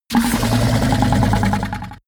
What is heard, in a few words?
fishman snort gargle aggressive; kreaturen